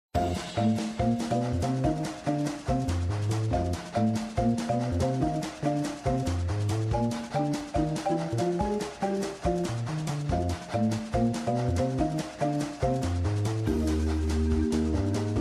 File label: Minigames Fast Theme; Choo Choo Zoo; Wizard101